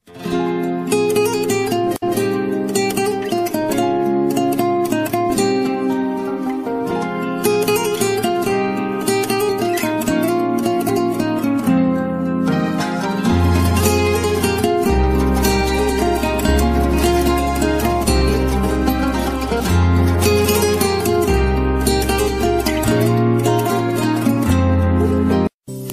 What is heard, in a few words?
latin love mix